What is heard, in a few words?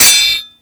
SW HIT, sword hits; Total Control - Action Cutz